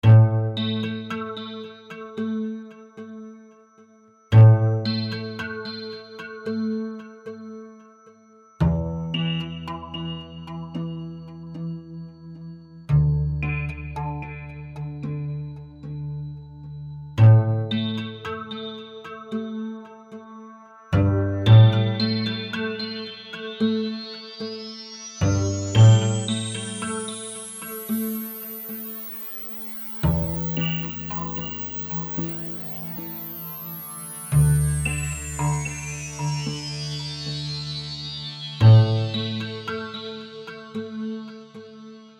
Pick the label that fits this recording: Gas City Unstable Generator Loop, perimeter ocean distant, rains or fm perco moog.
rains